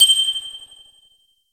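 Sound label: ping no reverb; ting bell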